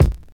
2000s mid range bass drum one shot g sharp key, Royality free kick one shot tuned to the G# note